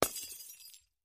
Light Bulb Breaks; Distant Glass Bulb Smash And Shatter. - Glass Smash